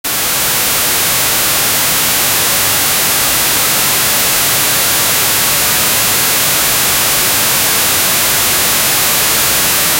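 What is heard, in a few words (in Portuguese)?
faca um som e estatica, tv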